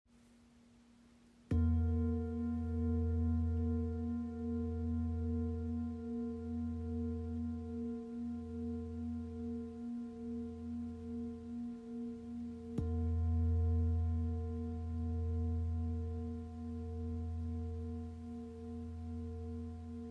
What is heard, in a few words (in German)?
Beckenschale